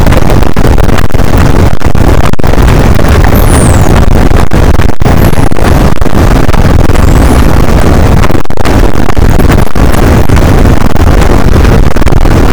cachalot negatif, boat, Physeter Macrocephalus

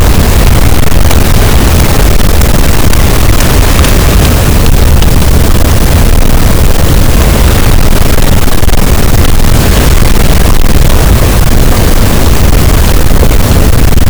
cachalot, Physeter Macrocephalus, gaussian noise

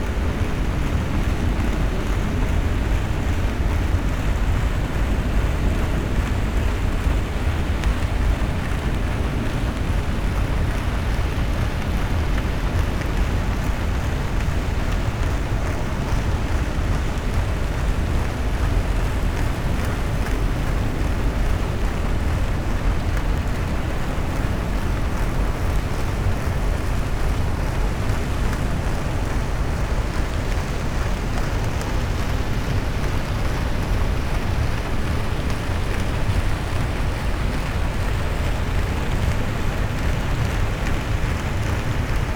cachalot negatif, Physeter Macrocephalus

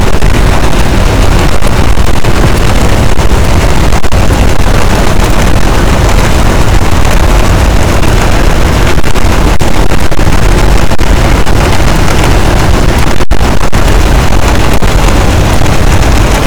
cachalot positif, boat, Physeter Macrocephalus

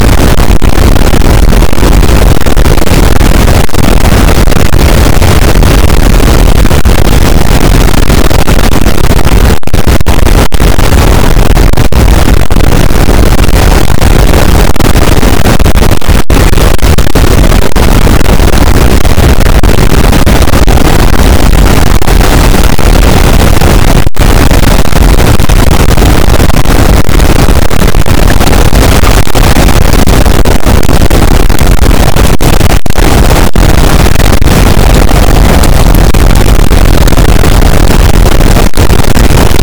cachalot positif, boat; Physeter Macrocephalus

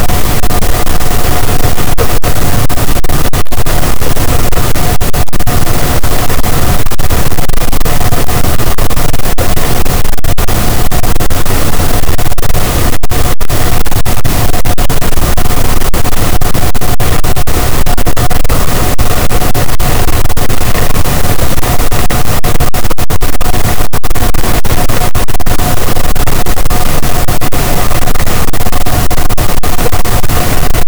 cachalot, gaussian noise; Physeter Macrocephalus

